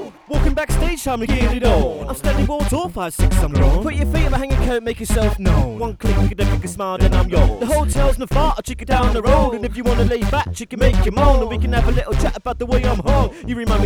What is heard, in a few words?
easy harmonic